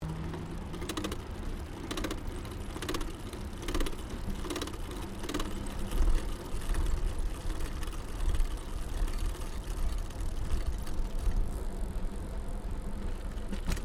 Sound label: Bicycle Riding Smooth Concrete Bad Gear Gearchange Stereo, 21 speed road bicycle; Giant Crx3